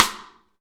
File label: SN AMBIENT; Best Service; Real Mega Drums VOL-1